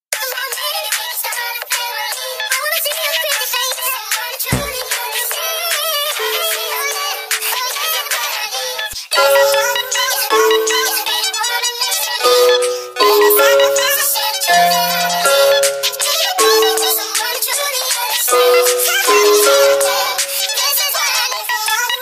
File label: INSTRUMENTAL REMAKE